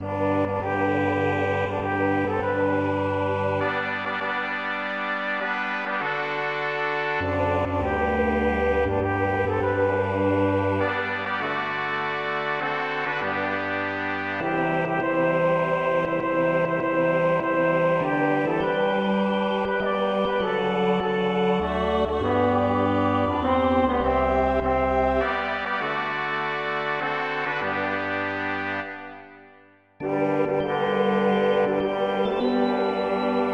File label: polyinstru; Entends-tu le chant joyeux; hymns